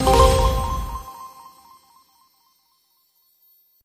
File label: show all; Hot Spin Deluxe